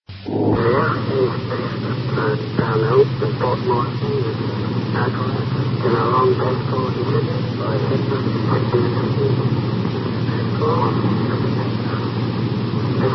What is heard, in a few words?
usb; ots port moresby; hajo russow, wbl